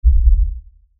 LFE Tremor, Lfe Tremor Low Bass Shake Rumble; Rumbles; Blastwave

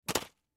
Flashlight Drop Dirt Plastic; Flashlights; Electronics; Blastwave; Foley

